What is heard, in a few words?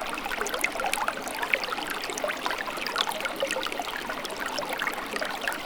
river